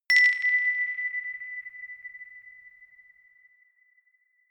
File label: notification glockenspiel rattle single; instrumente